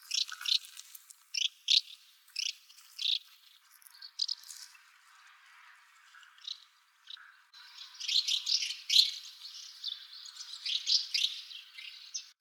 Siberian House Martin Delichon lagopodum, siberian house martin call